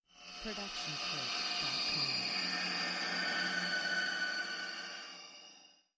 Screamy Screetchy; soundscrate

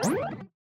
Bleep Charge Up; SoundsCrate

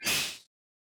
snake attack

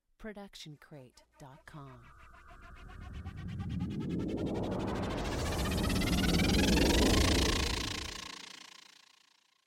Cinematic Riser

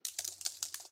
Computer Keyboard Group; SoundsCrate

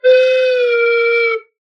slide whistle whining

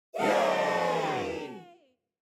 audience yay

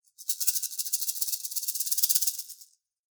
rattle snake; soundscrate

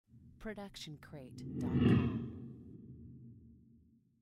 scifi fly by

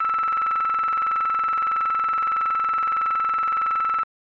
radar ping speed; soundscrate

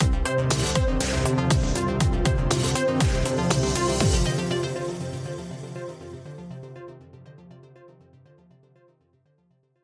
Synths and Groove Ident; SoundsCrate

